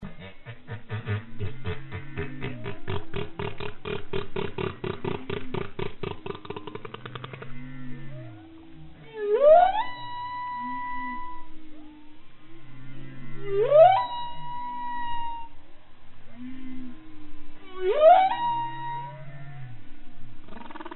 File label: hawaii humpback songs